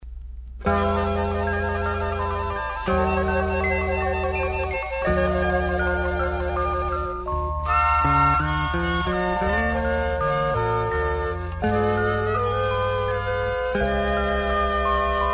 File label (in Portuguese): Sonata de flautas